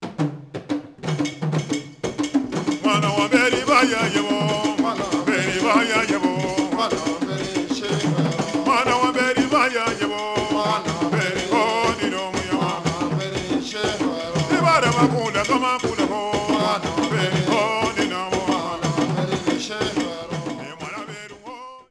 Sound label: AFRO CALL